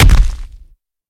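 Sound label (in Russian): тяжелый тупой удар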